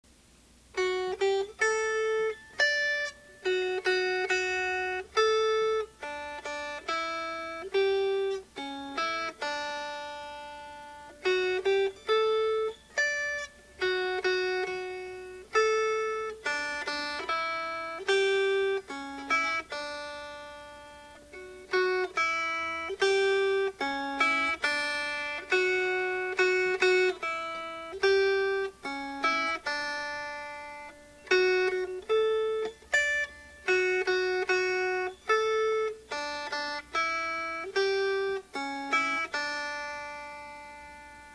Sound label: Melody